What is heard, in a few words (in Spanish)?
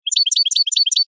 floreo bachi bachi; campero